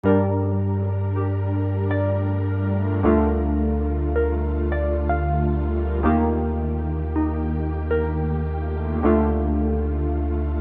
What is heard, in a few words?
Electronic Bass Remix